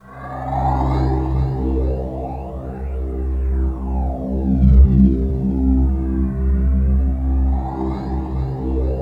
EASTERN C2; Q Up Arts; Dream Experience

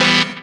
DR. LOOP; X-Static Goldmine 3; CHORDS

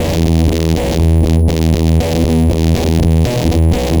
Guitar Loop, 120BPM; musicradar; bitcrushed; Crushed Loops

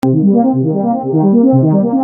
Arpeggio 120 Cm, Techno Synth Loops; Sonic Foundry (Sony Creative Software); Signals I

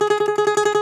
DOMRA G#, Russian Strings; E-MU Producer Series Vol. 7 – Old World Instruments

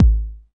909 BD, TR-909; Best Service Dance Mega House Dance

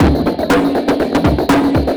bong rumbl mid, breaks breakcore

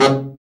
BARI, BARITON SAX; Sound & Vision - Gigapack II CD 1

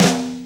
SD, HIP, Dance Mega Drums; 90 s Sample CDs; Best Service

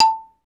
XYLO; Roland - LA Composer vol. 1

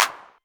Clap, Novation Drumstation; DrumstationV1

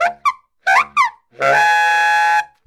SAX SQUEEL; Hip Hop; E-MU Formula 4000 Series Vol. 1; Hip Hop Nation; Booty Brass

